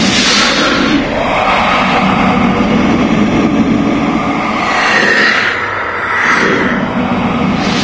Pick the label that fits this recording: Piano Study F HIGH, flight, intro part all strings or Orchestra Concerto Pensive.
flight